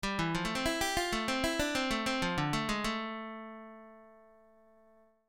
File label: Lick, Blues lick